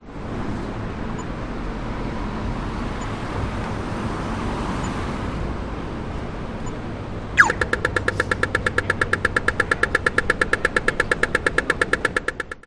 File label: brisbane cbd pedestrian crossing beep indicator